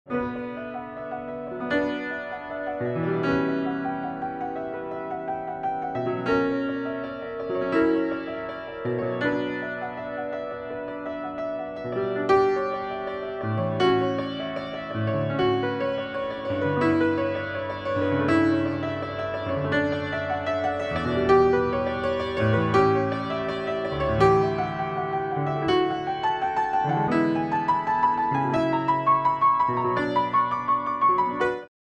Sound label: Prelude in B Minor